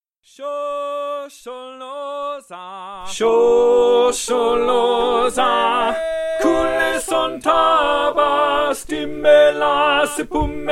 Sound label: Shosholoza tenor